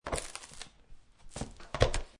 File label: Ring Binder Open Close, Office ring binder, letter folder being opened and then closed; Objects; Lynne Music